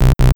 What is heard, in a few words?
incorrect